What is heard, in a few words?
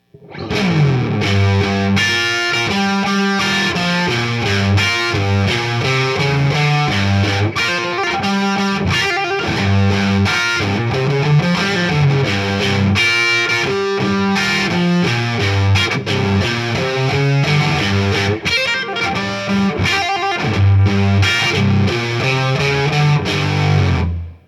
Smokey Fuzz; pedal; DNA ANALOGIC